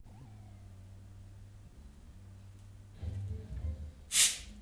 moog

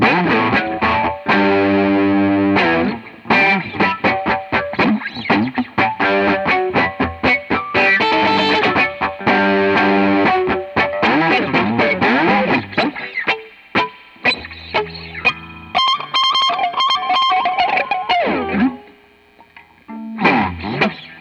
guitar

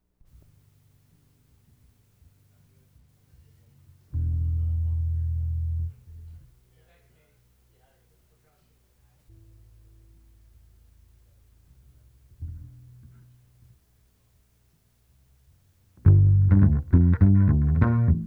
BASS

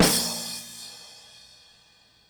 percussion

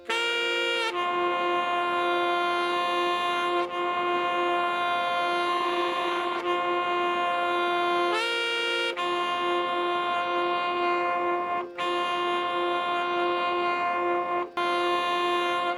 sax

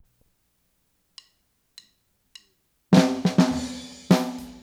snare

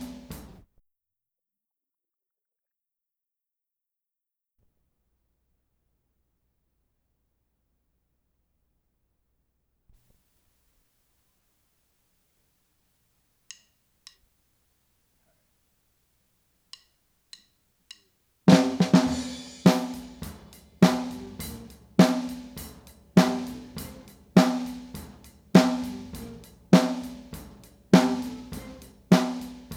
snare

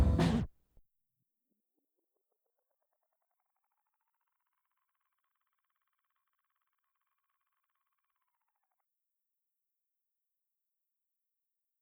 kick

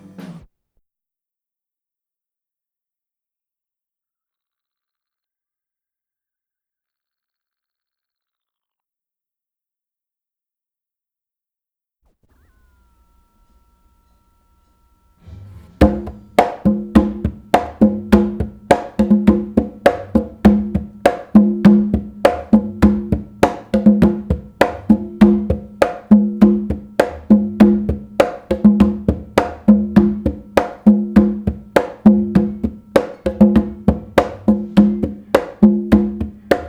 conga

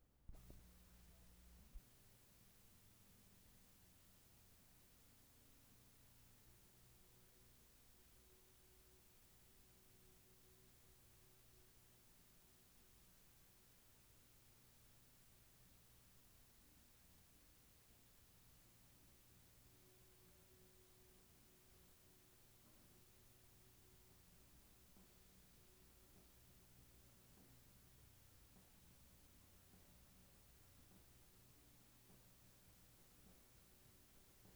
SAX

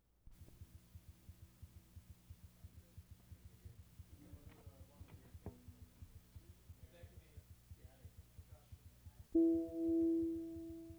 GUITAR

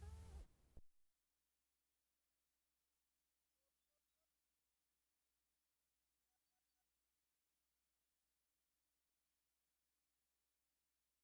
sax

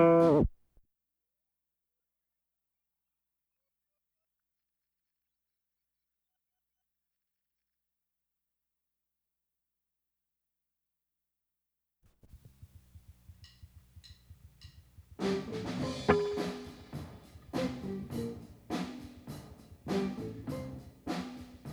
guitar

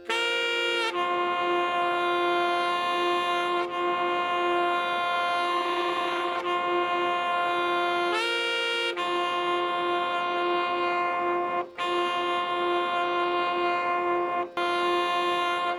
sax

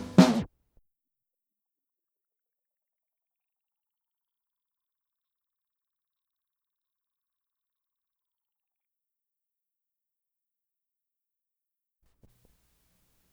snare

